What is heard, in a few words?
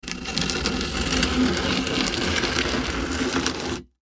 Plastic Bin Slide, Plastic Bin Slide Movement Drag; Materials; Blastwave; Foley